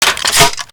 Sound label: m203 load, foley; gmod; viper